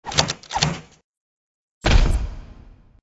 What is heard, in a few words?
rubber stamp; Toontown Online